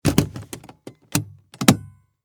Suitcase Close, Foley, Suitcase, Close, Full, Hard, Shell; Latches; Latch Sound Effects